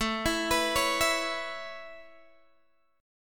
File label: A Major Add 9th A Guitar Open G, chord